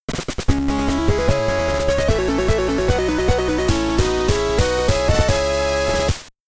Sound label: Castle Cleared; Infernax; PS4; Xbox Series X/S